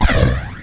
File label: emitter; Binder; Arkade